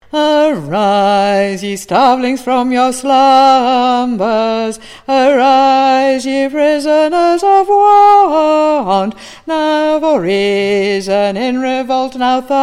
Bass

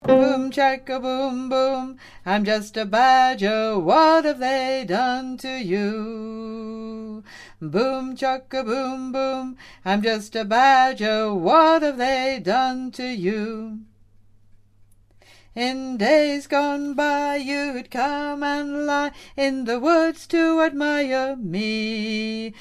Middle Ch & V

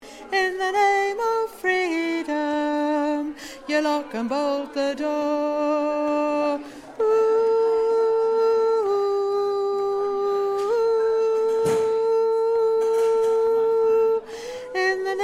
we got fooled Sop

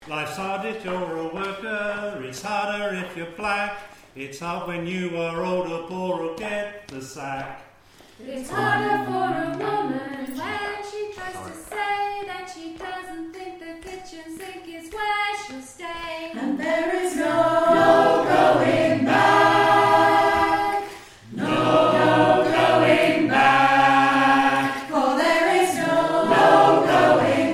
solo

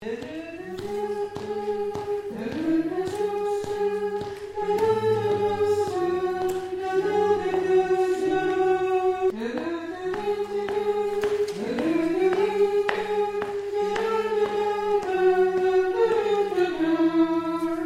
Hum

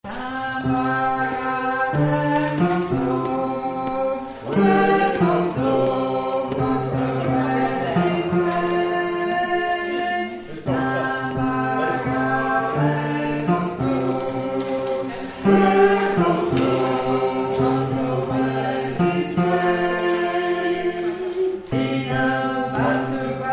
bass

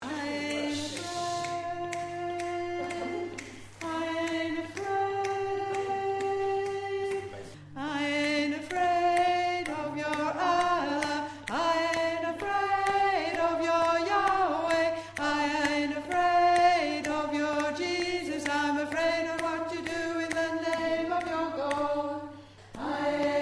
alto

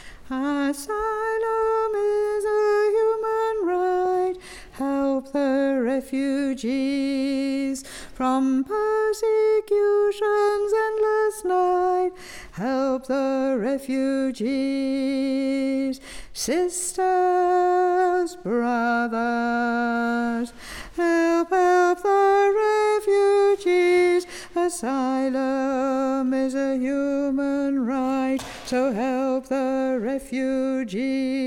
Alto

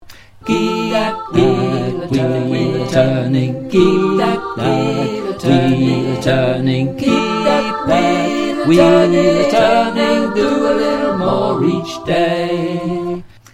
Chorus Voice All

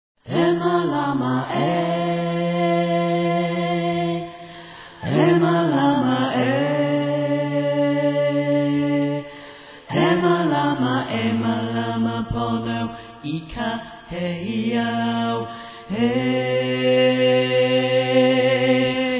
bass; manchester

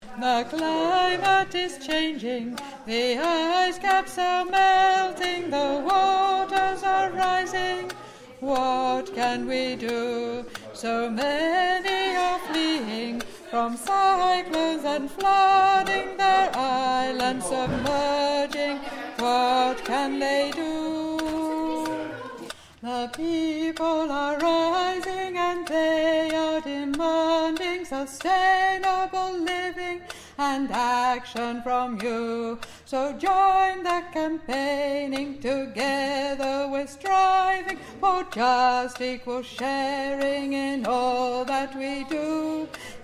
Bass

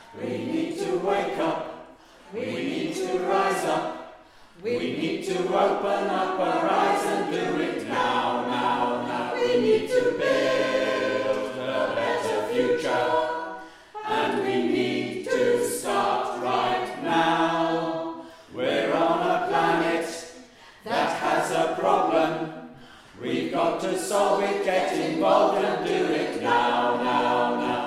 bella Ciao Climate All

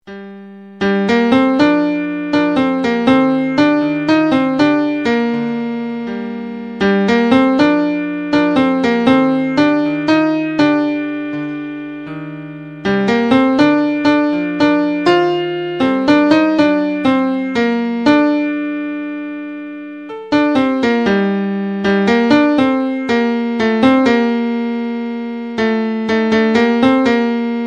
midi alto

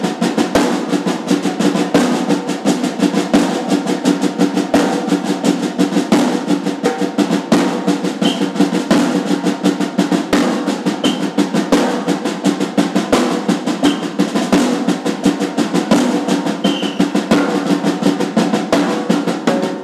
Snare, samba

